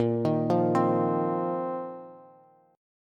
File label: Bb Suspended 2nd Suspended 4th Bb down Bass; arpeggiated